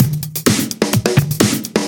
Straight Loop 128 BPM, House; Best Service; House Definition VOL-1

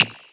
Percussions

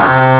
heavy Violin; ST-XX Amiga Soundtracker Sample Packs

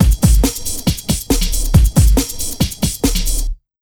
Big Beat Drumloops, 138 BT; USB Soundscan vol.25; AKAI; MOVE 138

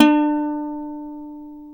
UKULELE D3; AKAI S-Series CD-ROM Sound Library VOL-7

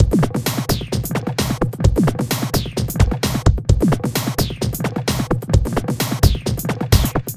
Processed Beat, Drums & Percussion

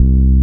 Basses Root C

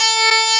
HARP; CMP - Analogon

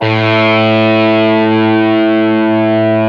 GTR SOLO, ROCK GUITAR; Greytsounds VOL-1; ROCK GUITAR1

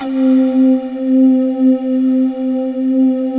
C3, Choir Acoustic & Synth; USB Soundscan vol.28 - Choir Acoustic & Synth; AKAI; PANKALE

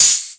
More Cymbals, E-MU Pro-Cussion

